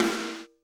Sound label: SD, Drums; Bob Clearmountain Drums I